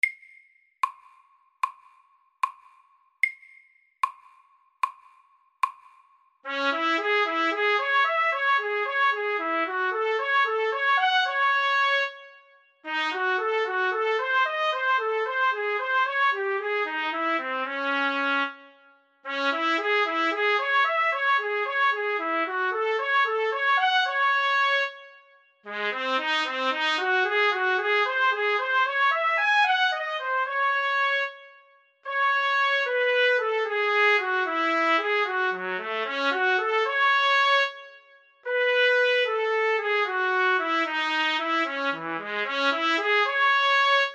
Etyde i Ess moll MIDDELS

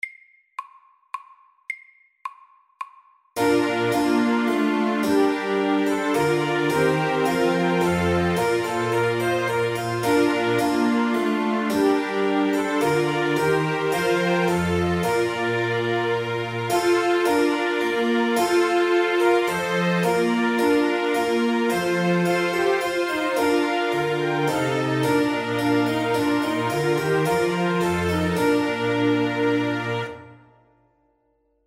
Menuett SAKTE

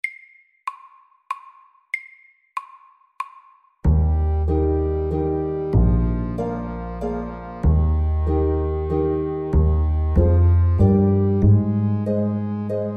Sommervals MEDIUM 95

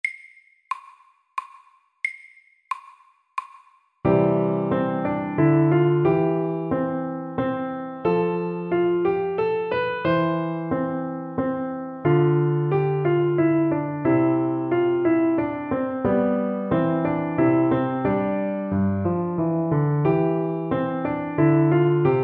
Menuett i G-dur sakte

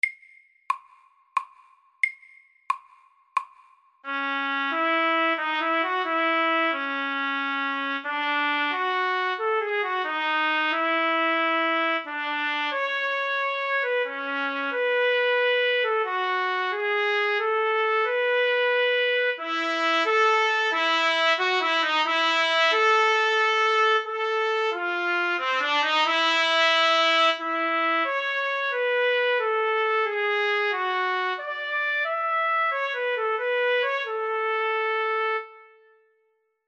Etyde i H dur FORT

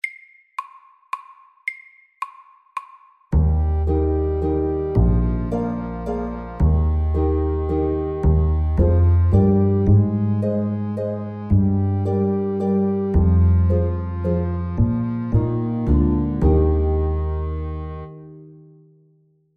Sommervals FAST 110

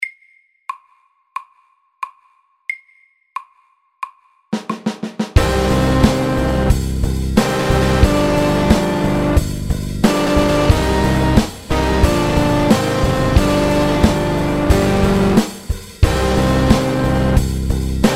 Triplet metal SAKTE

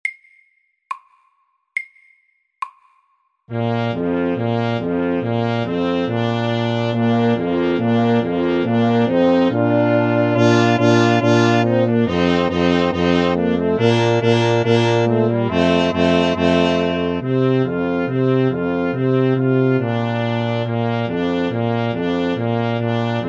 Fanfare MIDDELS

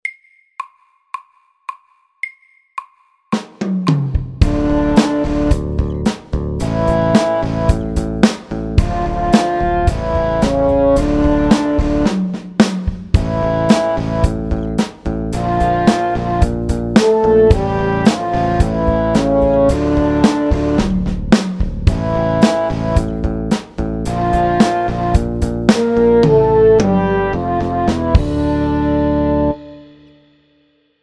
Elektrisk rock MIDDELS

